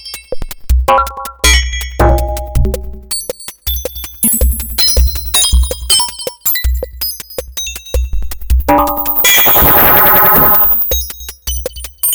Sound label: synth